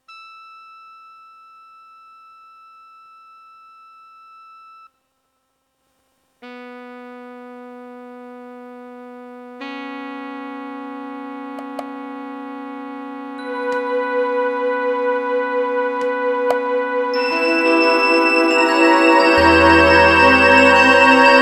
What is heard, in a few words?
SC-55MkII via CT2800 gameport default malfunction; MT-32